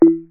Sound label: computer error; Unity2D; Upgrades Messages